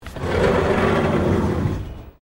Door Sliding Exterior Aluminium Flyscreen Open; Various doors

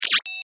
Science Fiction Beep Glitch; Tech

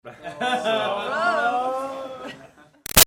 Crowd High School Class Laugh Reactions; In - Sit Down; Chat; Schools

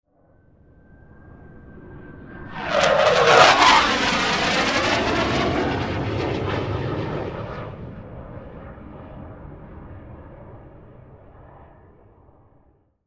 Jet Fly By Pass Overhead; Fast Rip; Airplane; Warfare; Blue Angel; Airplanes; Blastwave

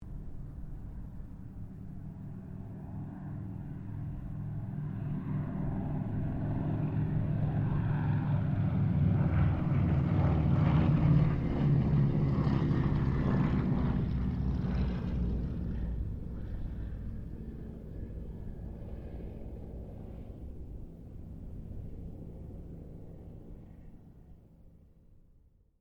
C195 squadron 2 pass, Airplane; Mixed aircraft squadrons; Airplanes (Sound Effects:Vehicles)

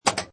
Pinball Sound Bumper Ka Klunk; Video Games

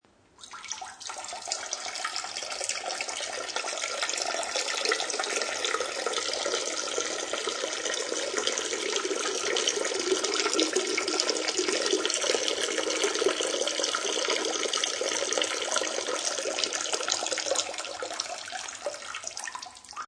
Urinating, Male urinating; Human

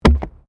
PLASTIC RUBBER PIPE; Material components for constructing more complex sounds; Materials

